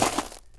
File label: rocks step; Original TK Sounds